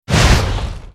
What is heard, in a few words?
pyroblast effect